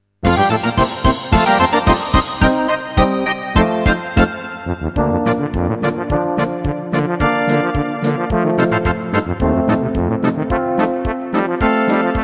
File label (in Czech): Tuba